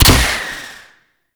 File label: Fire, Huntin Bow; Paris Redux; Weapons